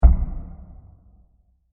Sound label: Diffuse Impact; Low Impacts